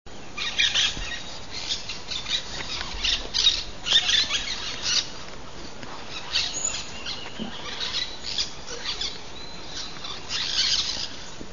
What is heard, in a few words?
aztec parakeet, Belize birds birdsongs